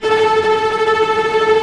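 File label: cello tremolo A2; Digital Sound Factory Virtuoso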